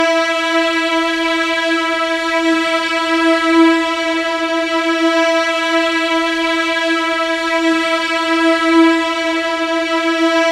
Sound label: Strings e3; Bodies